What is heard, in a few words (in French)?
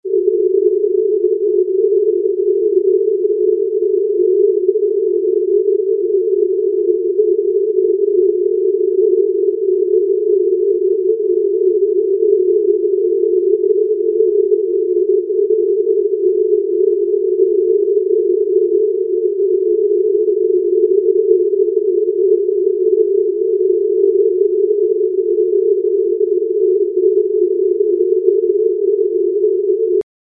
bruit rose